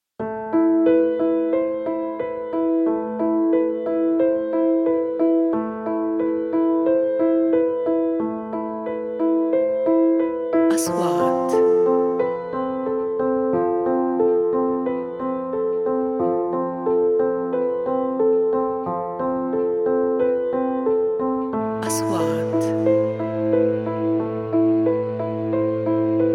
documentary

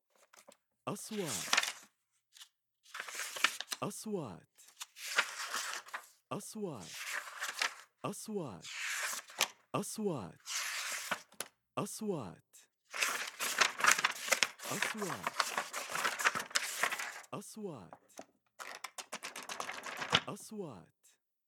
browsing books papers

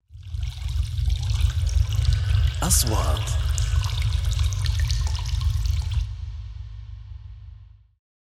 water

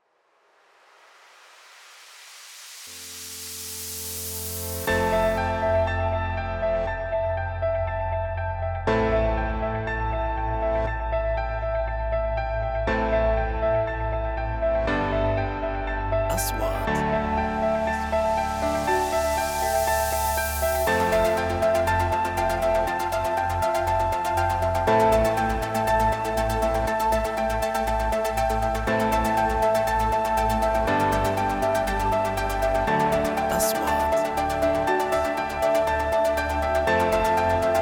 corporate

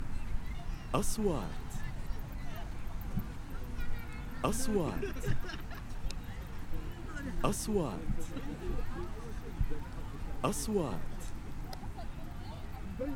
Beach Natural Sound; sound effect

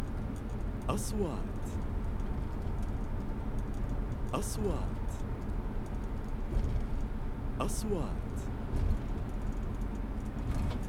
Cars Travel

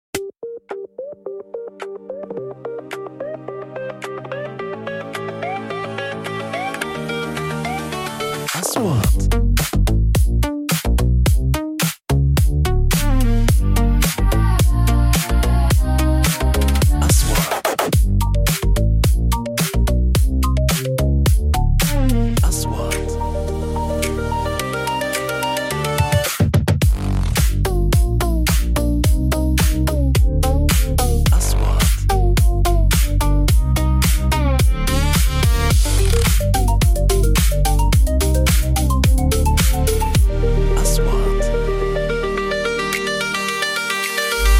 Squat; advertisements

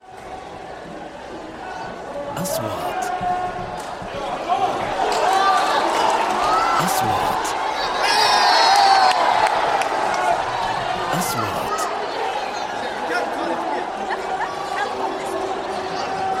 Applause for football; Raction

